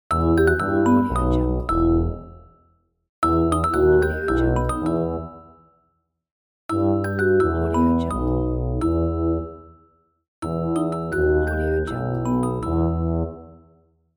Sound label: magic success